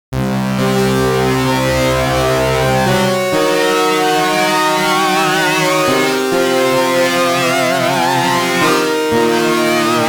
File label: Osc Delay Repitch off